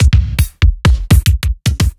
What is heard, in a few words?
Breakbeat Kicks